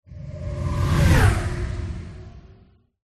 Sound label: Articulations Transition Sci-Fi Jet